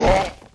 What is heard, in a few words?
hurt; FlyForInsanity